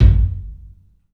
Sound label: KIK, LIVE CLUB KK, Drums; 3D Sound